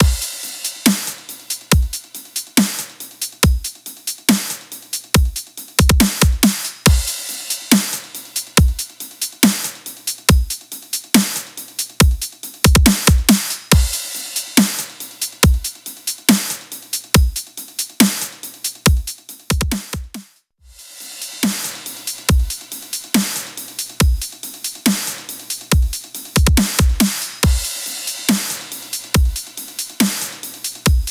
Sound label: dubstep slight parallel